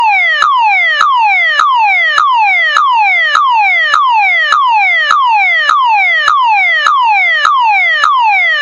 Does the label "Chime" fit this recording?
No